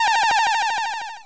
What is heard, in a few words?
phase change; doublespeakgames